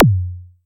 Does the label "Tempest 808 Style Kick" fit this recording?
Yes